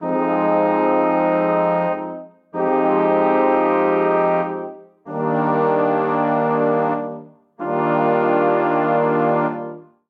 Progression Stately ii9 I9 ii9 vi11 Eb Major 95 bpm, Brass Quartet; MIDI Clips; Ableton; Spitfire Audio